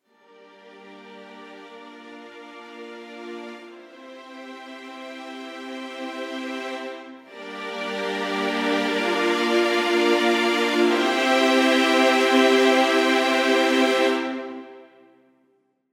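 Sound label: Pre Orch Ens 16 Violins 10 Violas 8va Sustained; Audio Imperia Areia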